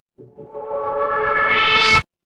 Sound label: transition riser